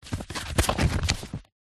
industrial sand paper sheet handling

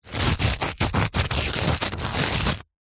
foley dirt thin layer scrapes

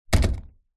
household door office close small room; zapsplat

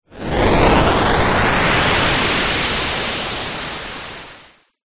multimedia retro game rocket launch

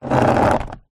household chair plastic patio drag concrete

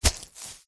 sponge wet hit dab squelch

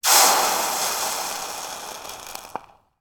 water sizzle hot metal object placed in water; sound effects one

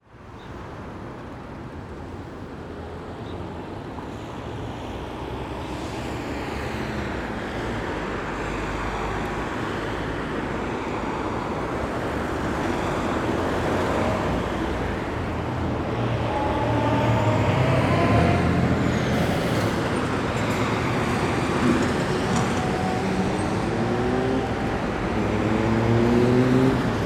transport traffic busy 4 lane underpass; graz austria; tom chapman

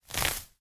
foley grass long weeds pull from ground

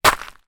foley footstep single on gravel dirt; zapsplat

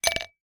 ice cube drop into glass

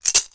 money piggy bank shake; sound effects one

